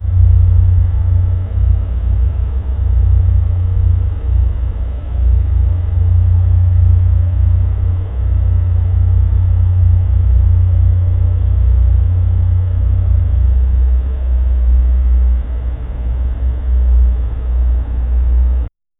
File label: the sound of the ominous, abandoned